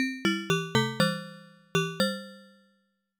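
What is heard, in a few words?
Game Over Jingle; Avenger Bird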